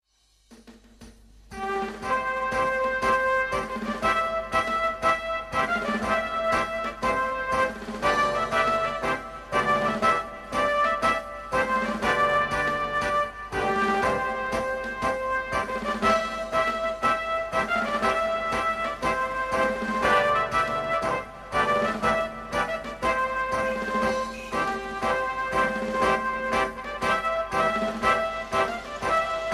Army Band Ringtone

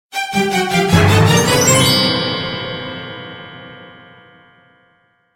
Magic Sound

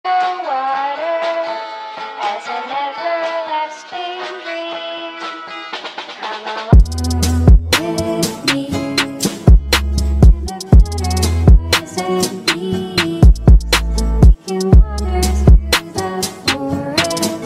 outro song; Dakotaz

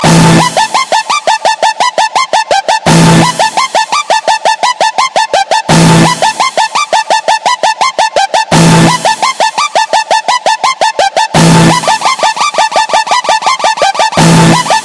HARD STYLE

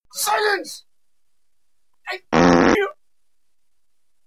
Silence I Fart You

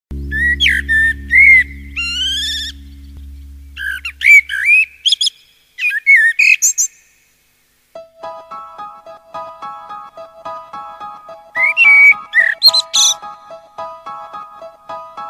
Bird Rhyme

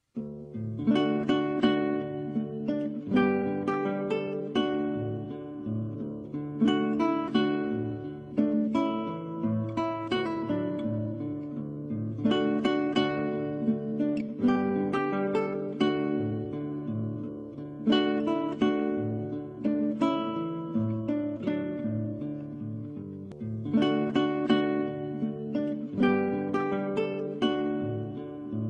Classic Guitar

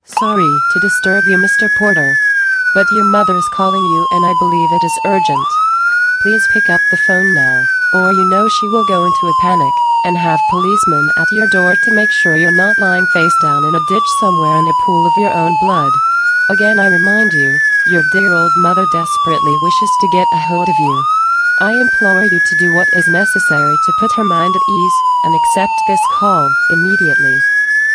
Emergency Call

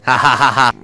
fake laugh

